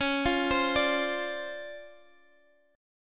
D-Minor 7th, C sharp; Dm7/C# arpeggiated

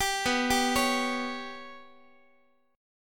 B Suspended 2nd Sharp 5th down Guitar; Bsus2#5 arpeggiated; JGuitar

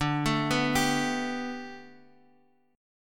B Minor 7th Sharp 5th D, chord

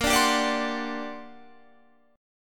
G Minor Major 11th, Bb

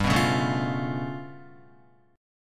G 9th Flat 5th G down Guitar; strummed; G9b5

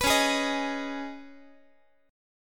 C sharp 7th Suspended 2nd, Guitar; C#7sus2; strummed; JGuitar

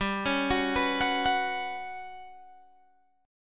G Major 7th Flat 5th G down Guitar Open G

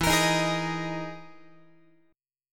E Major 7th Flat 5th, Guitar; EM7b5 strummed

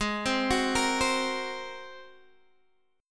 G sharp 9th G sharp Guitar DADGAD

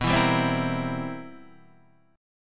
C 7th B, C7/B chord; strummed; JGuitar

